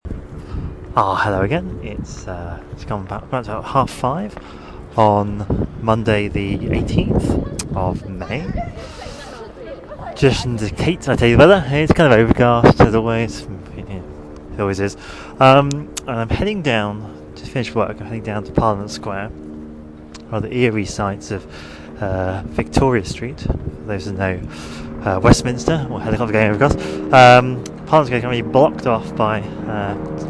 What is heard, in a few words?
empty westminster after tamil protest